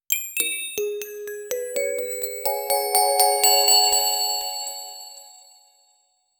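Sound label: wholesome magical notification, crystal chime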